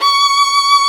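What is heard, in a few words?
Vio legato C#5; Digital Sound Factory Virtuoso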